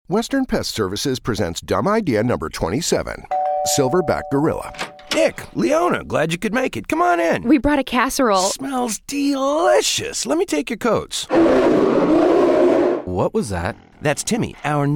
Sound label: gorilla